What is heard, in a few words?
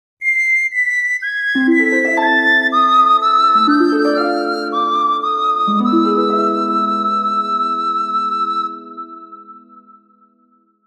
flute of warlock